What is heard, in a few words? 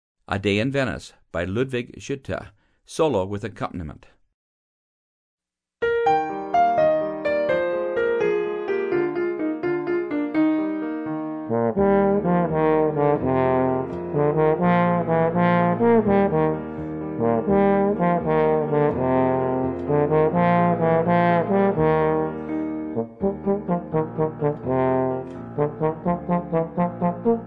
Trombone and Accompaniment; Grade 11 Solos